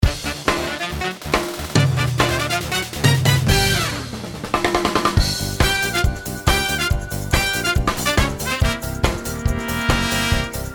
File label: theme (w flute solo); Sabado Gigante; Univision Networks